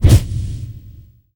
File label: Pig Poof; Nicktoons Nitro; arcade